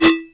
perc, opl3; M8

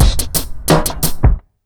BEAT, Underground Hip Hop

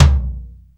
DRY TOM; TOMS; Back In Time Records; Big Bang

